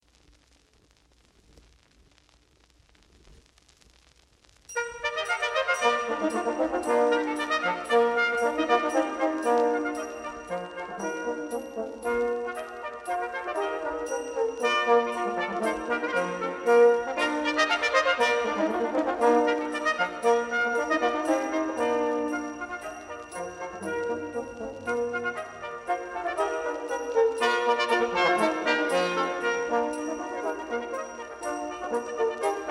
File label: Brass Baroque Brass; Des Prez; Heth Sold Ein Meisken